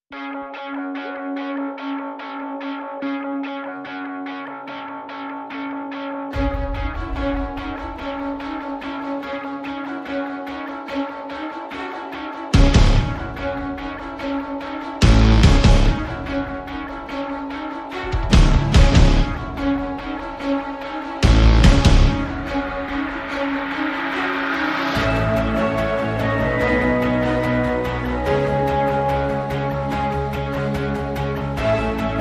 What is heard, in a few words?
No Drum Kit